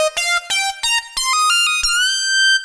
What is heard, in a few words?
Lead Synth Lick; Dr. Fink's Funk Factory